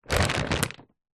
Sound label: food flour packet large pick up grab from floor